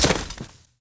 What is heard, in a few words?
Cloth